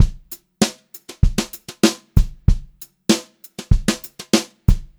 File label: brushed drum loops, beat; USB Soundscan vol.39; AKAI; 96 HOTROD